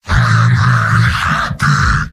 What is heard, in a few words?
positive vocalization; heavy; mvm